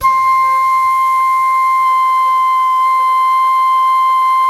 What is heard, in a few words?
FLUTE MF C6; Greytsounds - Philip Wolfe David Crozier's Saxophones; FLUTE MF-PW